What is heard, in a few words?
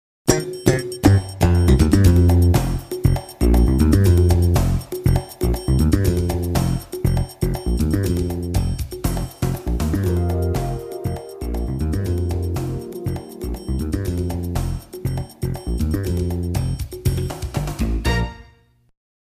game show back from commercial